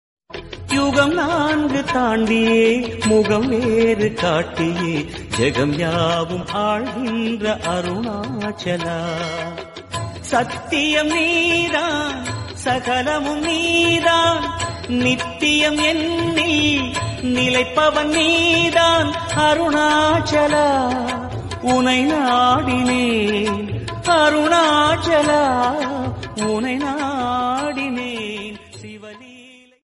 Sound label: song lord shiva god bhakti; sivan